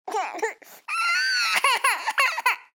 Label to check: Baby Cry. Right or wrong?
Right